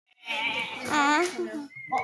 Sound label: auhhh